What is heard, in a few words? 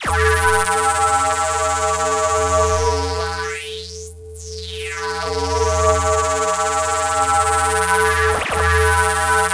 ring zap, ring mod; Spectrasonic Distorted Reality 1